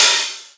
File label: Open Hihats, Cymbals; Vengeance - Essential Clubsounds Vol.5